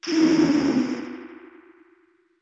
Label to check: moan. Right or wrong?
Right